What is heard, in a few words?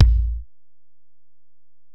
Ac BD, Alesis DM-PRO; 300 Drum Machines